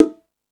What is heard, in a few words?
Latin Drums, E-MU Pro-Cussion; drum machine